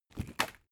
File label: spring binder close